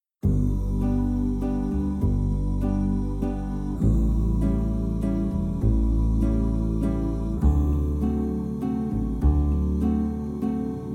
left voice

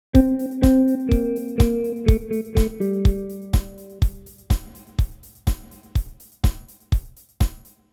Pad

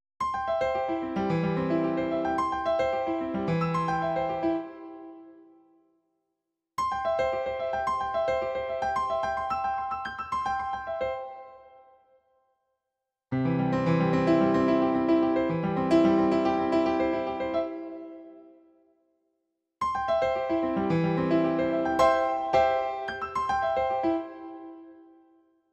Pad Piano Arp

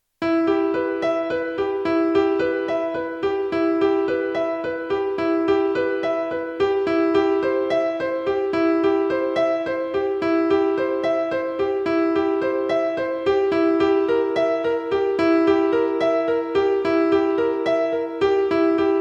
notes heard

